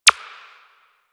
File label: Button Press; Warframe